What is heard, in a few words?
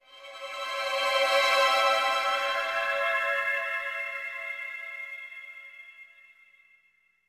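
Music Door Flourish; bolt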